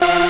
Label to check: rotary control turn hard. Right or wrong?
Wrong